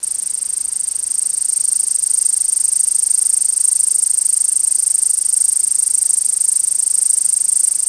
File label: canadensis, Michigan Cicadas